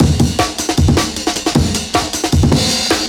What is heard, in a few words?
155 Rollin Break; m8 breaks